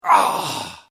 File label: Male Grunt Human Injury Pain Vocal Ugh Ouch; Grunt Sound Effects; Lynne Music